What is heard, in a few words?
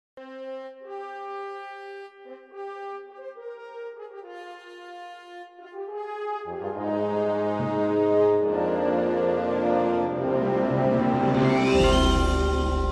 call to arms; DAoC